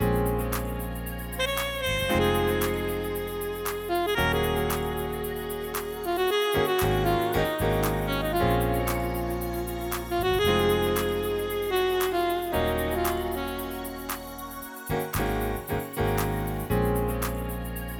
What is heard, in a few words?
Loop